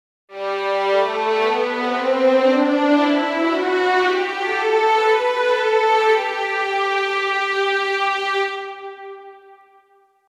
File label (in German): Violinen G Dur mit 4 Position